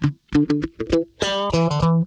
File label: THUDDY, Funk Guitar; 90 s Sample CDs; Zero G